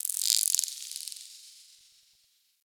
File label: Cephalon Tech Very Small, Ambience